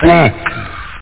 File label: jb(funky)